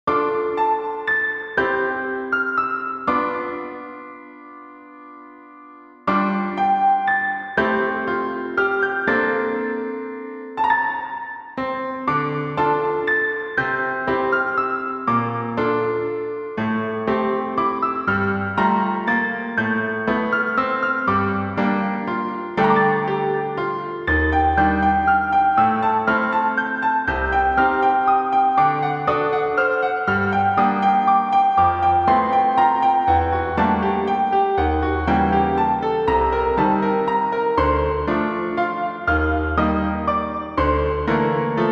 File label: piano